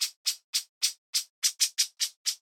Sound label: horornis diphone cry